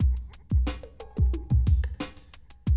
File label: Classic DrumLoops